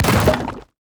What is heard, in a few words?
tower brew Master basic Attack impact